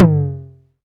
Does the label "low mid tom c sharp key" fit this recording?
Yes